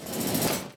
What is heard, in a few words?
menu out, UI